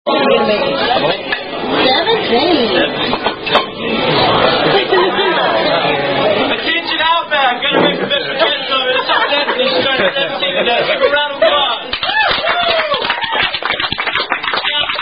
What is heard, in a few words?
Happy Happy Birthday; From Outback